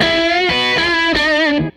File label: Tele Guitar Loop